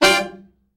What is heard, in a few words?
Killer Horns, SECTION 7TH; Best Service